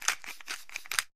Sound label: Pepper Grinder Vari